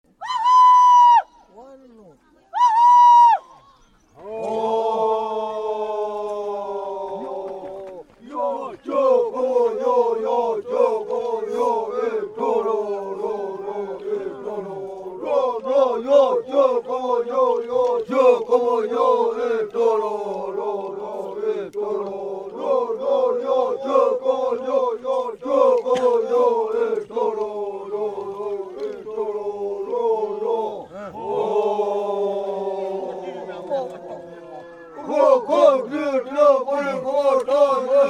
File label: Amazonian Chant